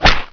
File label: sword, weapons